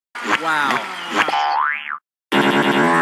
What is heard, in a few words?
fart mix